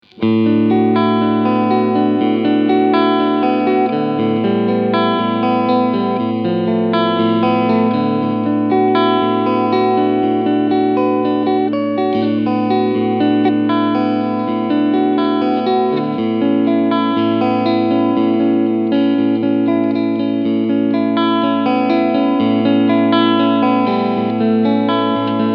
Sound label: Clean Front Middle SC